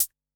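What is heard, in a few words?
Analog MT40 Closed Hat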